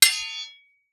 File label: Weapon Sword Hits Sword; AdobeCC